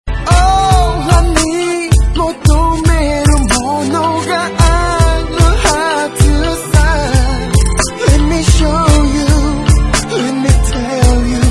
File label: sad tango Rain